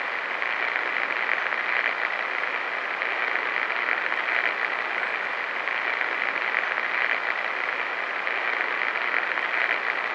white noise light long, radio; STALKER; IWP

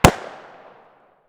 fiveseven muzzle mono far, weapons

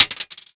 Shell 9mm metal; material; STALKER; IWP

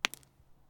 Shell 39mm dirt; STALKER; IWP

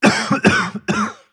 med health; STALKER; IWP

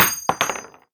Shell heavy wood; STALKER; IWP

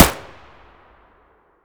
akm muzzle distant, weapons; ak103; STALKER; IWP

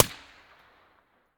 usp45 silencer mono distant

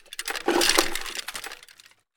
pkm holster

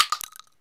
shell 12ga default; STALKER; IWP

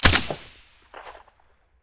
scar silencer stereo

